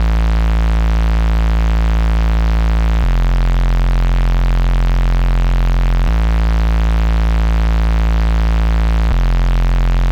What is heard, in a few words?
158 BPM A Min Bass; Cymatics; 2022 Melody Collection; Wavy; Stamina